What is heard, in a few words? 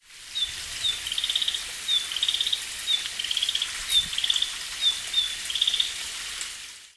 kibitaki call; キビタキ; 新潟県佐渡市生椿 森林; bird song